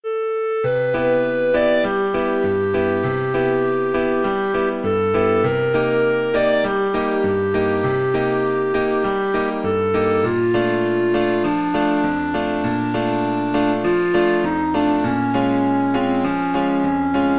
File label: beguine, clarinet